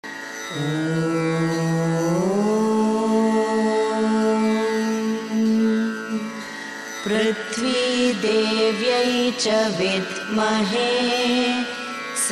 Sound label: prithvi gayatri